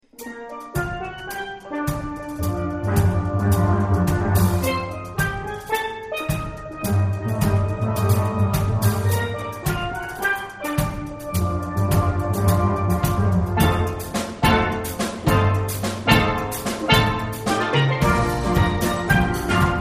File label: Steelband